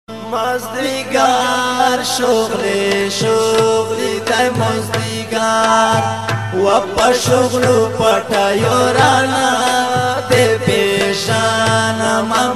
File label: Pashto RINGTONE